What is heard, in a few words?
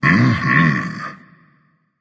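non verbal agree, voice; cernunnos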